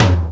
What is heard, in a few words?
high quality sharp tom drum single hit f key; tuned drums f key